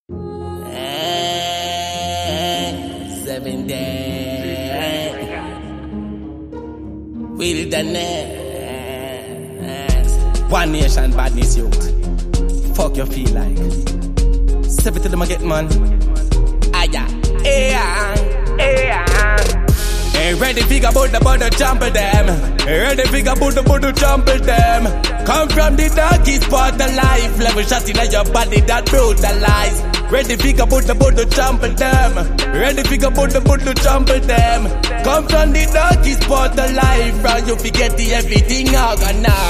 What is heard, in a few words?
glock chip riddim